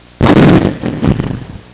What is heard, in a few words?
thunder